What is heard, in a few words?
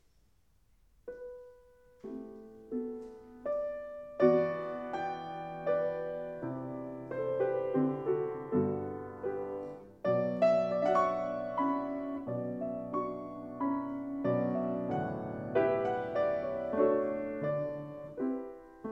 Ravel Menuet